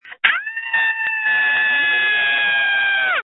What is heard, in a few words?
Scream